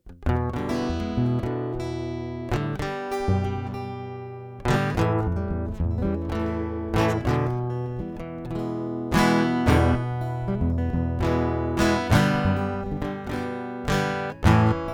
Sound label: guitar intro